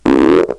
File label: truck fart